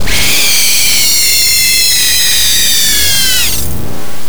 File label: VOX FX R; Big Beat Samples & More